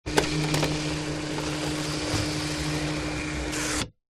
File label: electronic typewriter paper feed motor with paper; office; brother ax 450